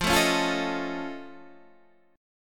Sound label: F Augmented 7th F Guitar Standard, chord; F+7 strummed; 13,12,11,10,10,11; JGuitar